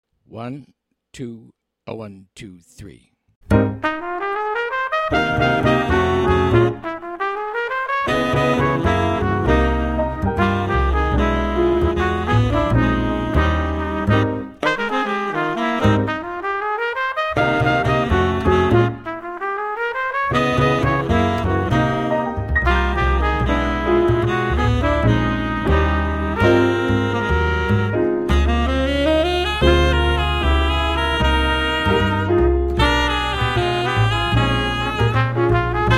minus Drums

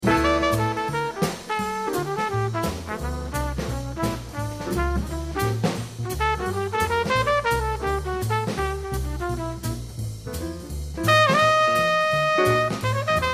solo

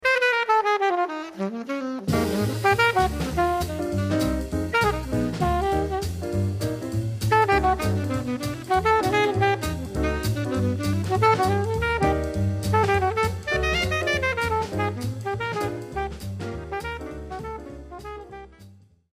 solo

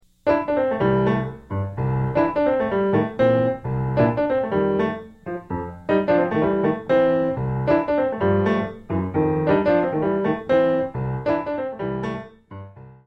Solo Piano

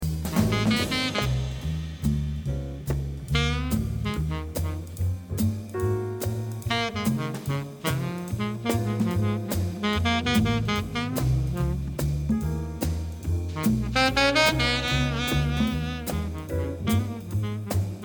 solo

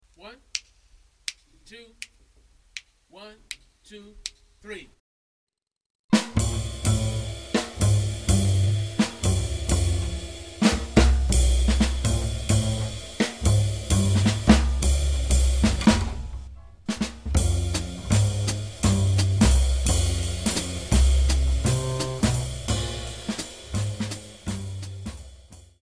bass drums only

